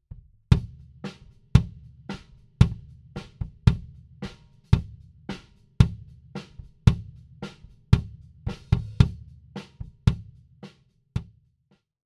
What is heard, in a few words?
Inside D112, bassdrum; mikros